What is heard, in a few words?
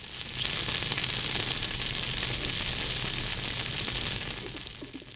Frying; OneShot The Pancake Episode